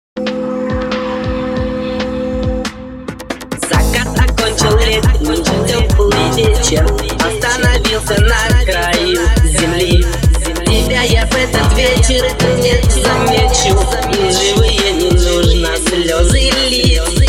DNB

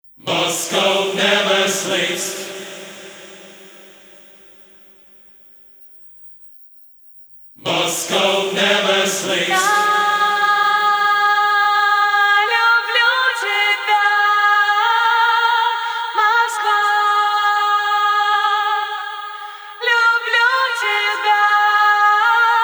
Acapella

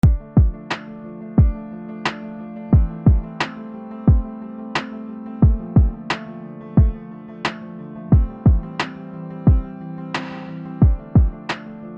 LO-FI

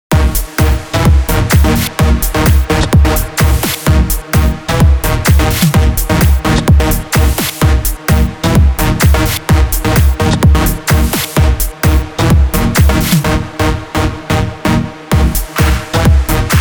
HD sound TEST

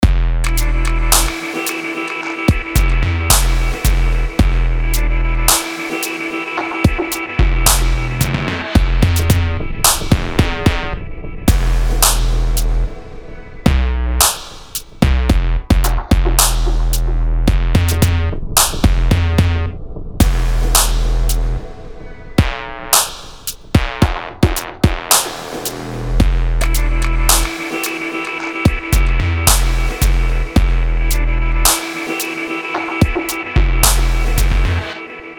Instrumental; Чёрный

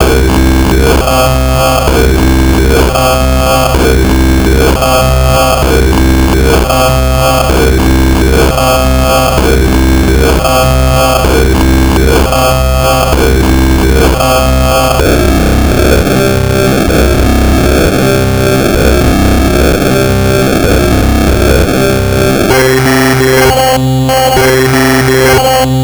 Robot 128 bpm C synthesizer CYCLOP; Rabaiten; VSTi; CYCLOP v1.3.7; Sugar Bytes

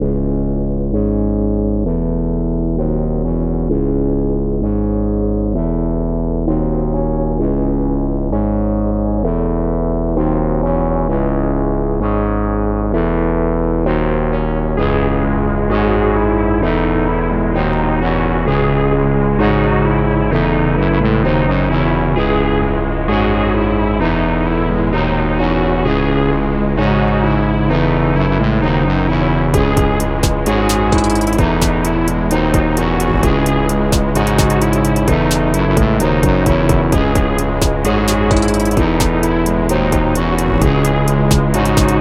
Electro Wave

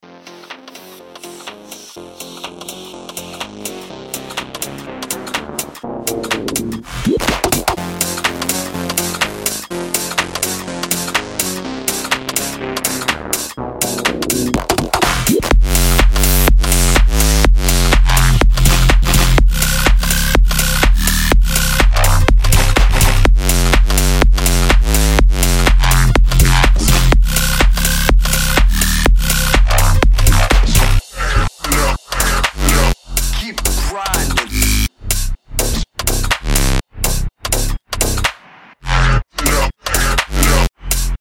G Beat Banger

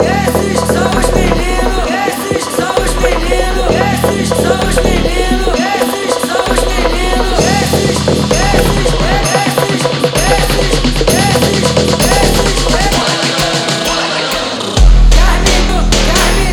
Brazilian Phonk

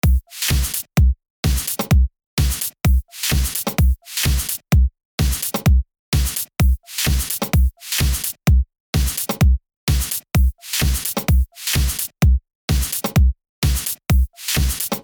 DrumLoops

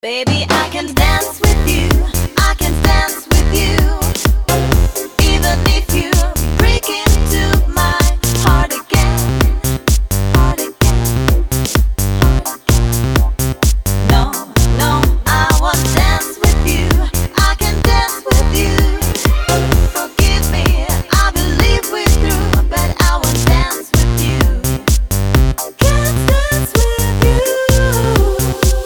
ringtone

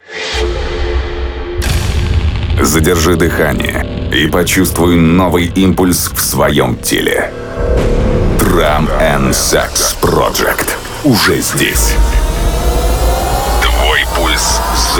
Drum n Sax Intro; M3; J-Factory

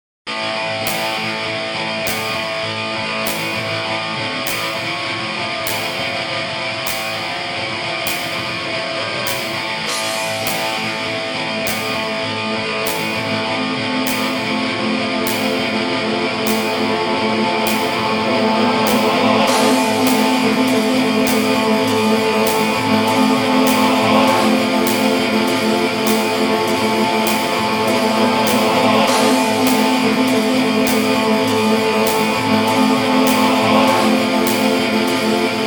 Rock-Opera-HOUSE

